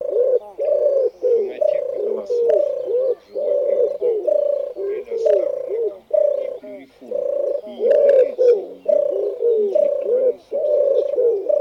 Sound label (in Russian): Вяхирь Горлица Colombaccio Tortora; plurifon